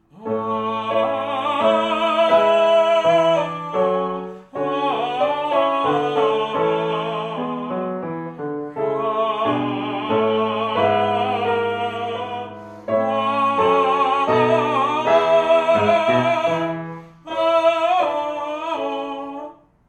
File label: solfeggio; Corri